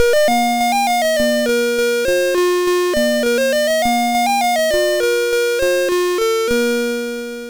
sid MOS6581R3, GAMES; Alice In Wonderland

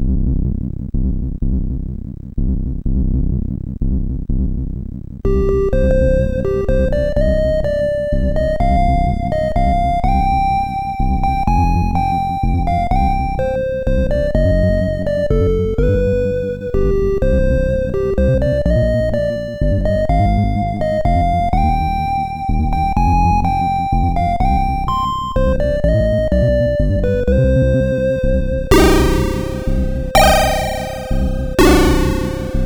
Pirate, MOS6581R4

